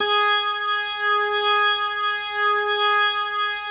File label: G#4S, B3, Vintage Keyboards